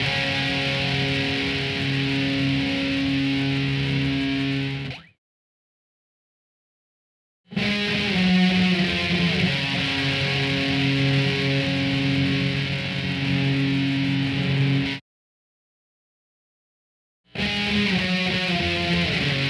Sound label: Guitar; Matt Hoffman's Pro BMX 2; XBOX